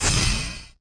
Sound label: box open